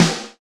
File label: SNR, Cheez Snrs